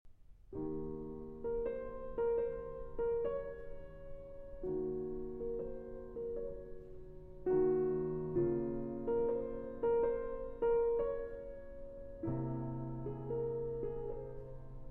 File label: (Arr. for Piano)